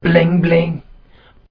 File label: BLING BLING Low Octave